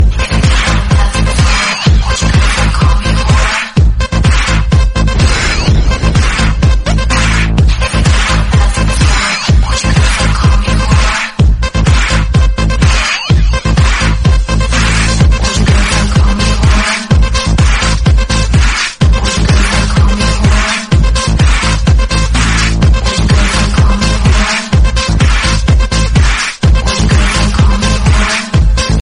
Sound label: best italiano dj tone ringtone